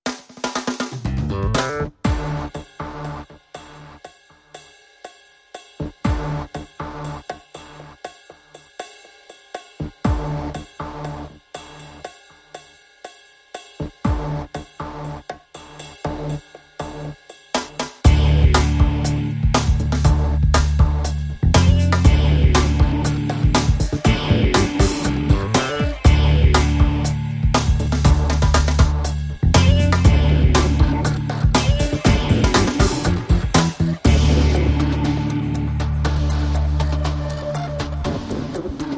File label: Funky, No Melody